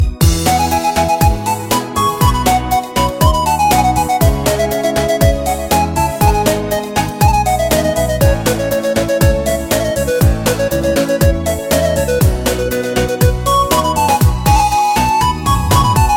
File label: romanian instr; Electronica